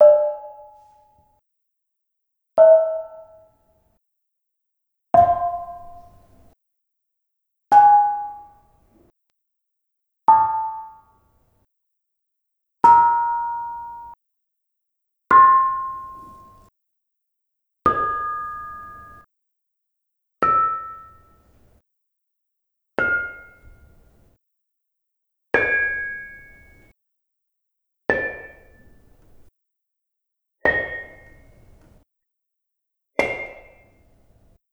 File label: Bonang Panerus Pelog Tones in Ascending Order